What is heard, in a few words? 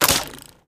tripwire; Minecraft; Votive World